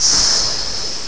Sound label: hiss; goatgame